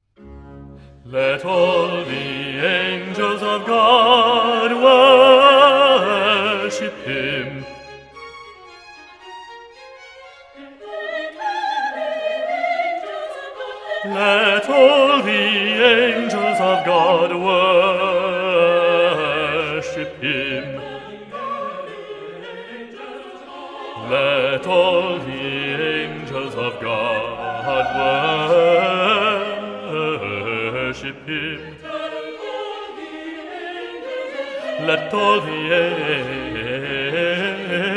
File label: Let all the angels of God bassi; Messiah; handel messiah